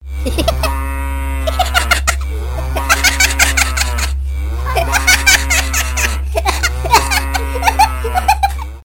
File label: Mooww Funny Laughing; Comedy; Hindi Comedy; Ringtones